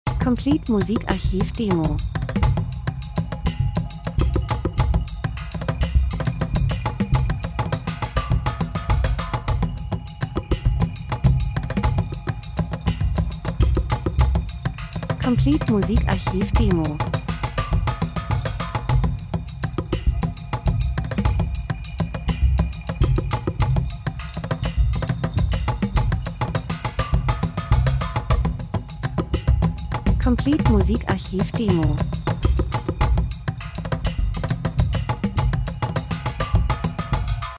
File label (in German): drums, rhythmus; Rhythmus und Percussion Spannungsaufbau Reisen Fernweh Traditionen Land und Leute Reisefilm Natur Dokumentation